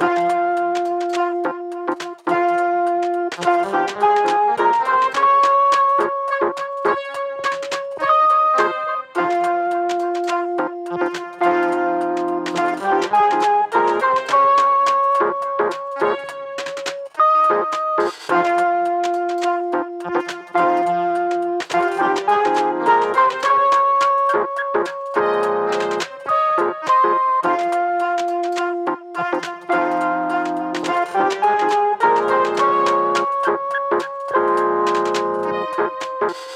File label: TV Tune; Afterparty Original Soundtrack; Wormhorn